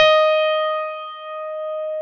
CP-70 D#4; E-MU Formula 4000 Series Vol. 3; Analog Odyssey

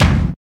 BD, KIT Real-Kit; 90 s Sample CDs; Sound & Vision - Gigapack I CD 1 (Roland); Real-Kit s10

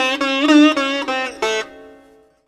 SITAR LINE; SITAR RIFFS2

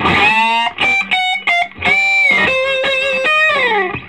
SOLO, Vintage Blues Guitar

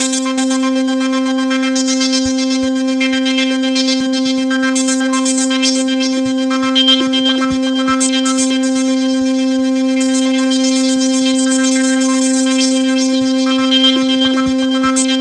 Roland JV 2080, poly saw

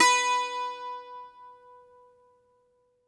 GR. BAZOU, Plucked Strings, Greek Bazouki; E-MU Producer Series Vol. 7 – Old World Instruments

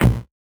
VocoBit Hit, 8 bit; musicradar; 8-bit bonanza

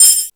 Multi FX

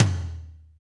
TOM, KIT Real-Kit; Real-Kit s12

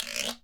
GUIRO; ILIO; Synclavier Percussion 2; World & Orchestral

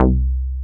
DANCE BASS; SYNBASS

